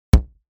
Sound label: kick; trg3